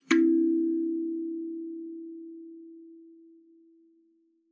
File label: KALIMBA E4; Xsample VOL-7